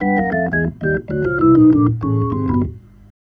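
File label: 99 A Hammond chromatic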